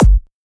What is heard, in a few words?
Dr Kick